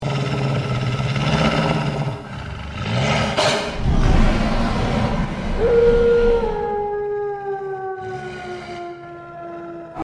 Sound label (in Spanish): cerbero sonido; semana nubosa; semana de mitologia